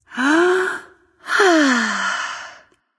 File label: breath light; smite; voicepack; hel